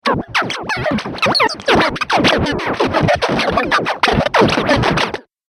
Conjuror Contraption, Science Fiction Sound Effects